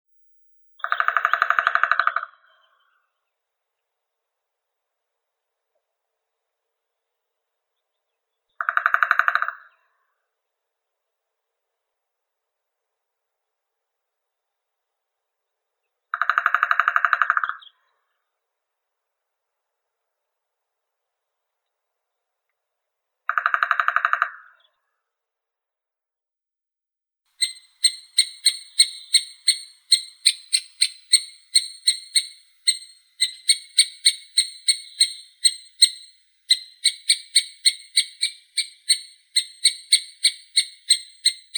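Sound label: three-toed woodpecker, Picoides tridactylus; dyatel trehpalii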